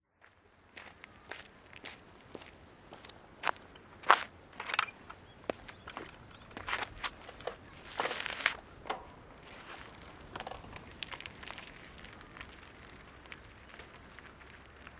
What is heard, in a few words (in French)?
Tour a velo Binaural